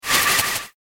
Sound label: Quick Ghostly Swirl; Ghost Sound Effects; horror sound effect; Andrew Fleming; Twistedtracks